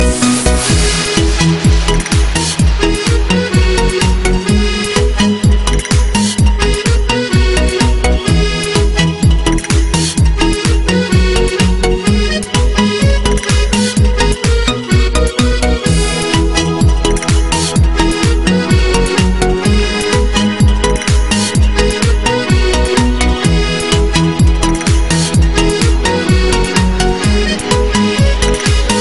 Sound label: fresh dance